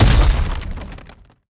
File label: strider step; Strider Remake